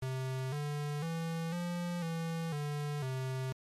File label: sing cdefedc